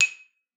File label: D#6, xylophone; Xsample VOL-4